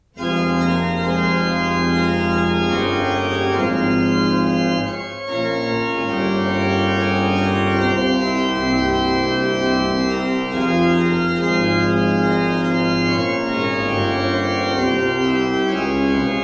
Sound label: Ott